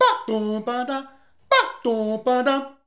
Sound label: "dabumbada", shouts; Best Service; Hyper Dance